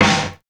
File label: Snare, Fairlight CMI III